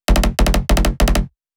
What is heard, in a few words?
Instrument Layer, bass